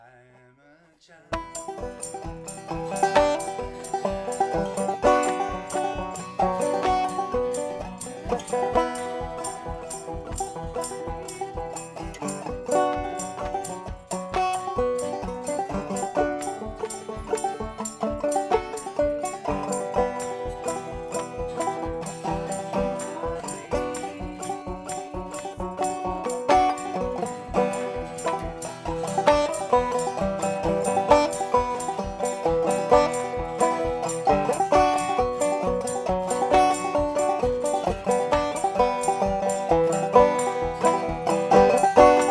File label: Banjo